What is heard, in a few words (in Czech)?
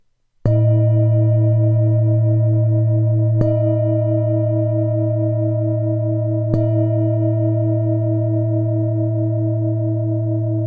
tibetska misa; a2